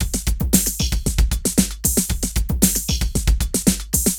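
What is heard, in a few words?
drum loops, right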